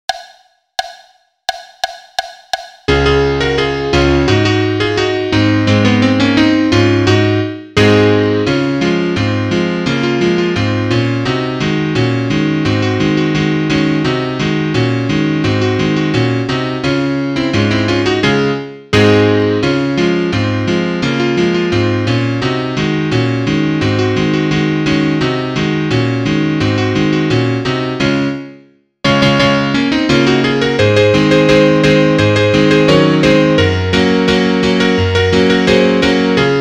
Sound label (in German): Klavier Solo, trompete; Samba Brazil; (Duett)